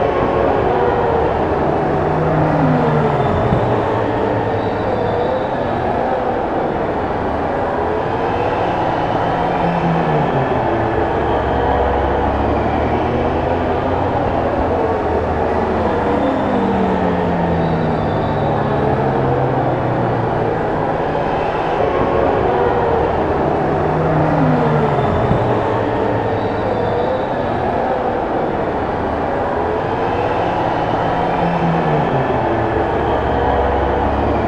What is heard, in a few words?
Track Ambience Clear; MotoGP 14; Milestone; Bandai Namco; vita